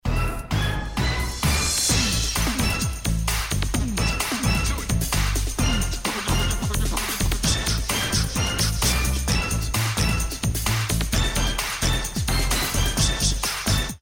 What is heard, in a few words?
won round; music kits custom